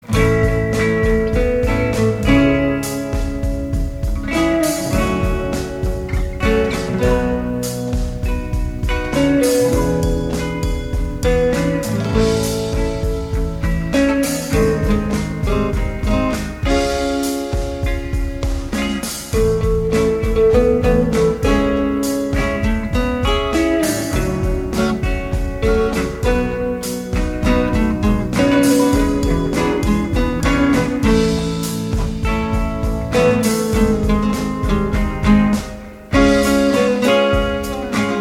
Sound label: Hitting the Road with Drums